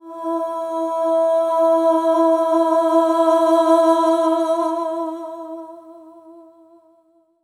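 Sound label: SOP X2 E4 R, soprano; Spectrasonics; Symphony of Voices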